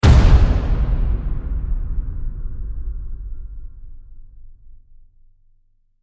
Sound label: boulder strike